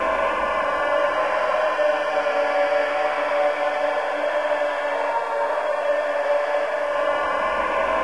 suffering

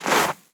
snow, player steps; quake2

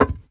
tile, player steps; quake2

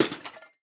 junk, player steps; quake2

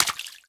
splash, player steps; quake2

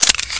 usp clip out, weapons; svencoop

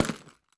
boot, player steps; quake2

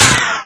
power; quake2

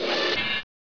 walk left foot; rustbit; svencoop

